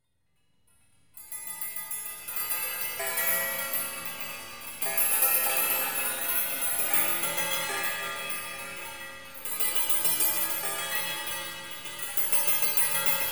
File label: carillon; Hexagone